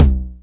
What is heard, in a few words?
909 Lo Tom; 909 Extended